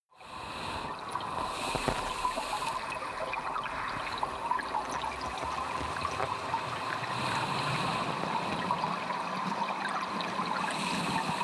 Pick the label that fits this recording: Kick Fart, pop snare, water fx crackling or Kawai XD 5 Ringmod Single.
water fx crackling